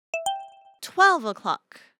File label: Hourly Alert